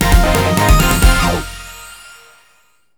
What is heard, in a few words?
JINGLE BOSS ENCOUNT; maimai